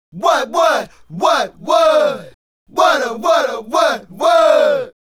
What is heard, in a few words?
Male Group, Vocal